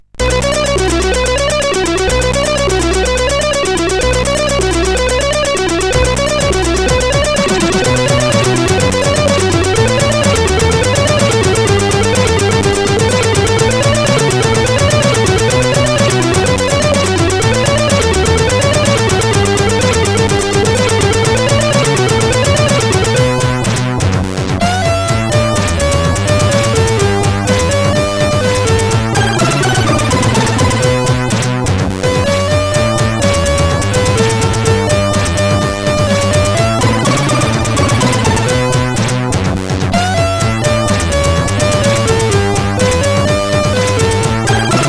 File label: Celebration tune, CSG8580R5; Celebration tune 03